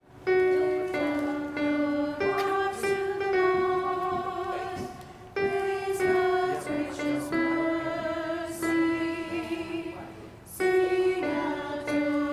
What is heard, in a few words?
alto; Gather Hymnal